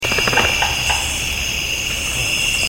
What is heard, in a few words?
knocks amplified